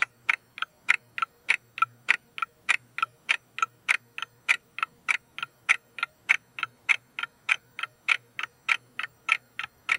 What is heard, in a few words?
clock ticking soft repetitive